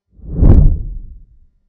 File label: deep whoosh effect